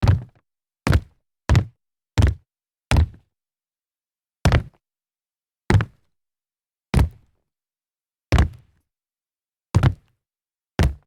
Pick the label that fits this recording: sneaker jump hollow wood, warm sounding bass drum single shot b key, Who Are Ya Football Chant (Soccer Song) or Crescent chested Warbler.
sneaker jump hollow wood